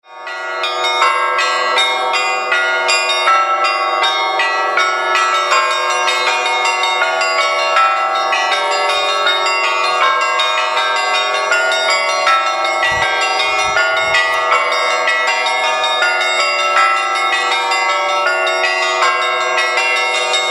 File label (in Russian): zvon